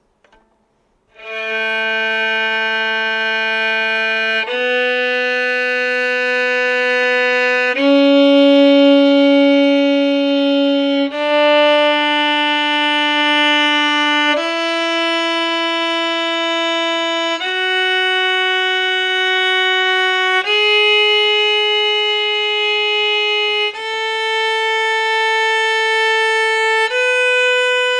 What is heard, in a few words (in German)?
scale A dur